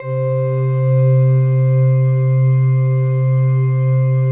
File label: PAD, DIGI-PAD